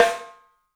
MARCH, 3D Drums; 3D Sound; MARCH XTREM

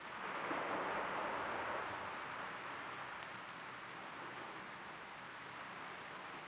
Beach Light, Water

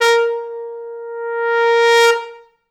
Pop Brass; Best Service ProSamples vol.19; AKAI

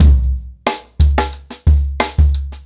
90BPM, ACOUSTIC DRUMS

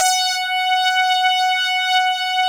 SYN SAWLEA; Sound & Vision - Gigapack I CD 2; JD 800/990

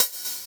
Open Hhat, Hihat; Equipped Music; Loungin House

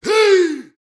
BRAZIL, World Voices

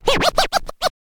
scratch kit, vinyl

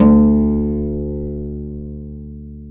HARP D2; AKAI S-Series CD-ROM Sound Library VOL-2

